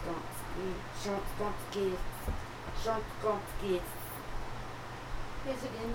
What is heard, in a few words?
chant; ELawrenceDecatur